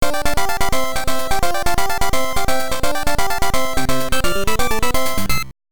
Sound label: Victory Dance; pinball; HAL Sound Team